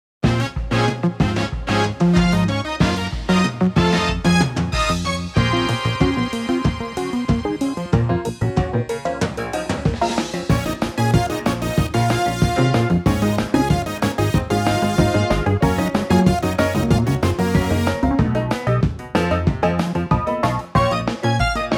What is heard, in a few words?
Bouncing Boss Battle; Kirby Star Allies; Dedede's Drum Dash Deluxe